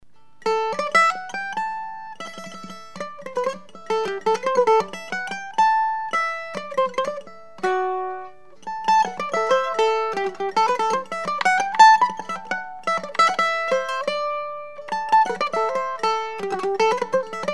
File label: Swedish waltz, classical mandolin; Peter Coombe Mandolins and Guitars